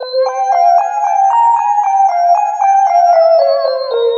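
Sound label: extra lead (spooky)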